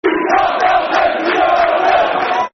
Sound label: We Are Albion, Football Chants; West Bromwich Albion Wba; fanchants